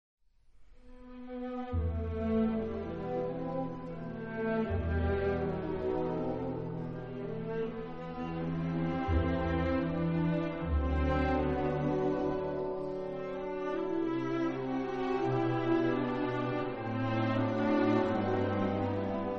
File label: piano Reverie; Concertante